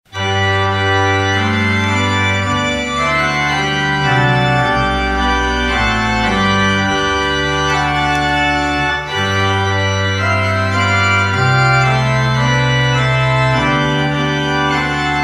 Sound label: Ringtone Organ Joyful Intro